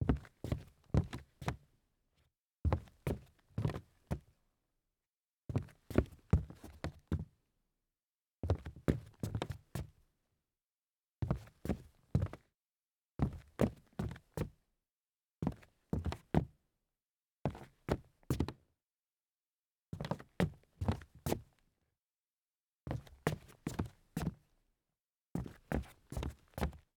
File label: Footsteps On Hollow Wood